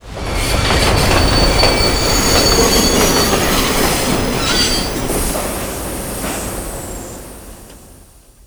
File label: train approach